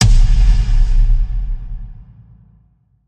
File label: hit big